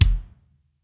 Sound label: Throaty Kick